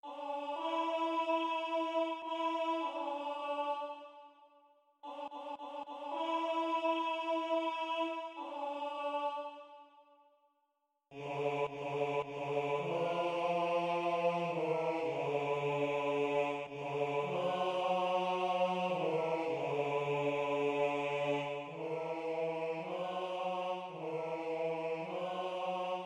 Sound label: bass; Ets Khayim